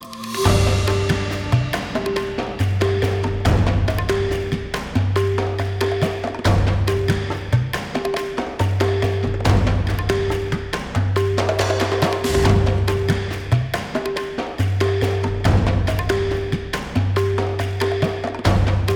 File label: Upset Cyclops; Skylanders Giants